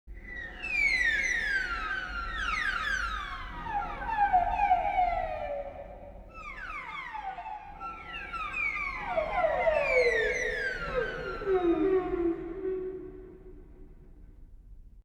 Cello seagulls

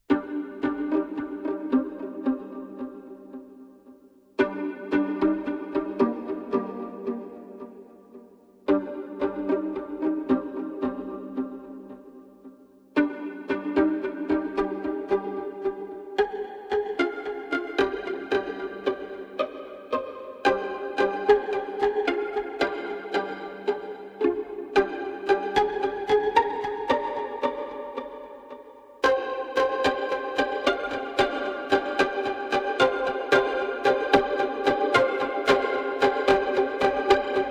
violin pizz

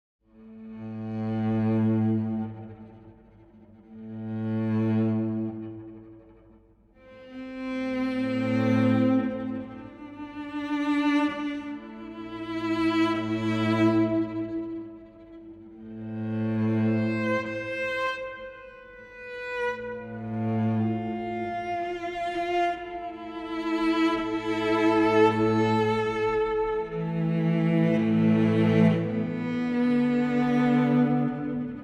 Cello low tide

